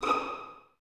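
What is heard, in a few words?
Violins D#6 pizzicato, String Ensembles; Miroslav Vitous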